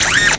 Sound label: cable zip